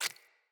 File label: Root Spider Step Water, Foley; Animals